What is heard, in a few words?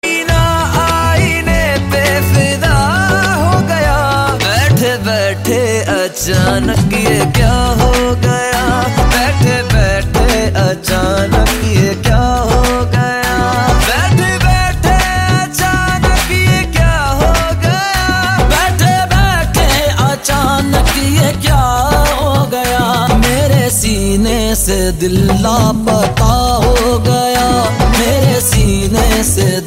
Hindi Song